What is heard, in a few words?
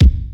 rap bass drum c key